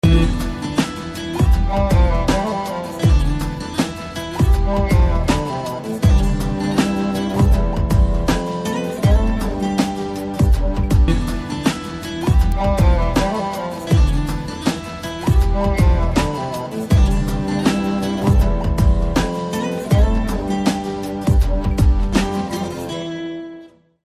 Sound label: Romantic BGM Guitar Ringtone; Telugu Ringtones; 24 Sec; Puri Romantic Ringtones BGM; from Akash Romantic